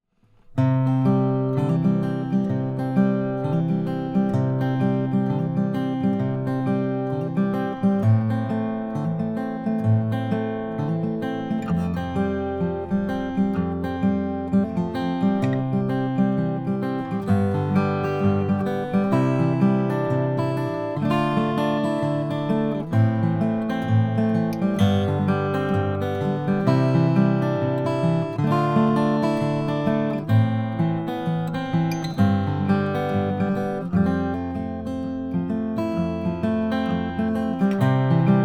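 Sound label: froggy bottom r 12 sinker mahogany, guitar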